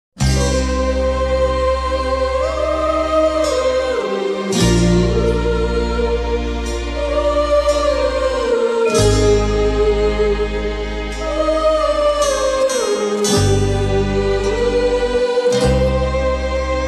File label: sad male